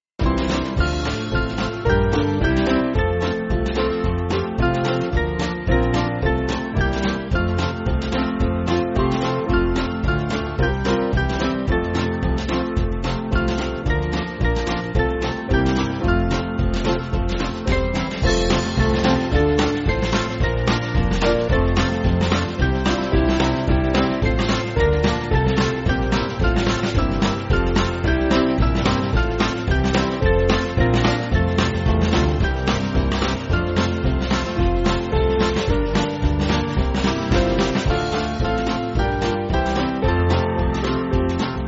Band, church music

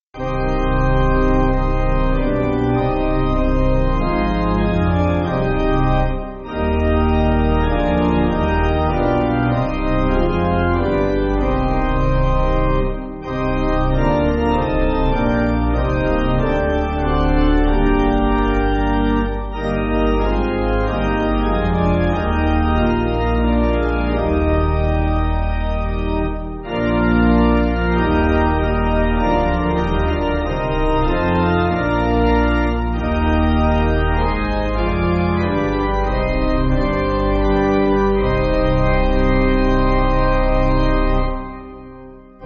Pipe, church music

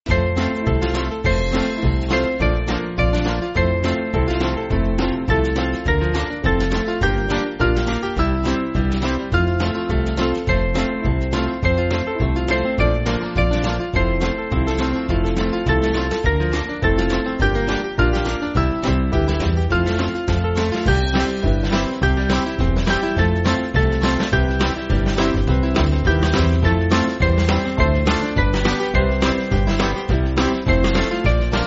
Band, church music; The Lord My God; Nun Danket Alle Gott

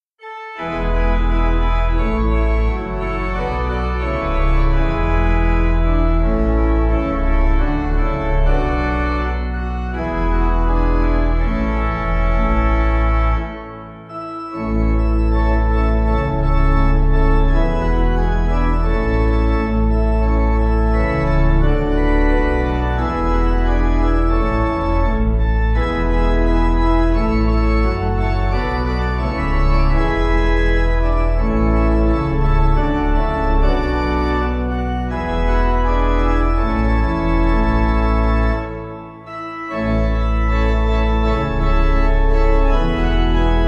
All In An Easter Garden, Pipe

